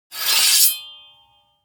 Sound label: cinematic transition scrape